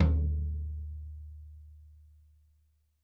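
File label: floor tom; drum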